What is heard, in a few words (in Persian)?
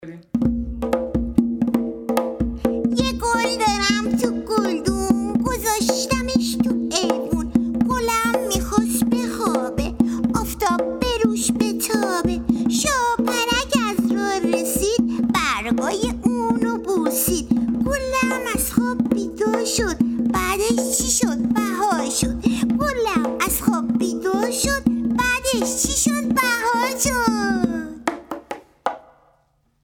گل و گلدون